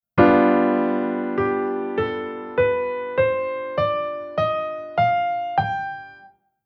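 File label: Chord Scale Minor Melodic Minor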